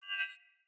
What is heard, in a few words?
Long Sword Whoosh Accent; Weapons; Player; Swords; Pothia